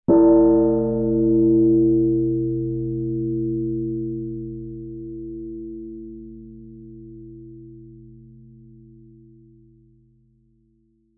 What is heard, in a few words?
Church, Bell, Ring; 5.1